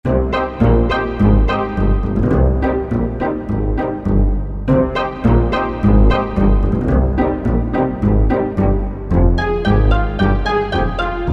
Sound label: Orca Pizz